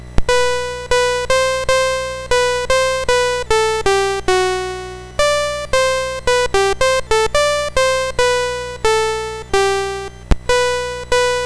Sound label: Guitar BASIC, MOS6581R4